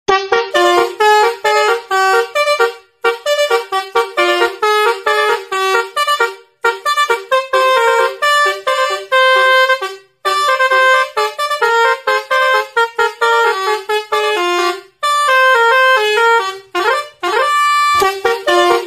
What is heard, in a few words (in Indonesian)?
Telolet Basuri loud